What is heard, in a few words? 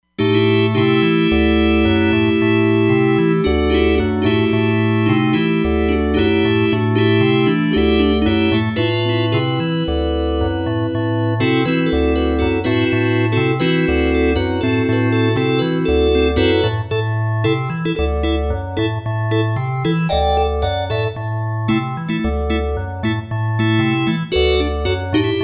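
60's organ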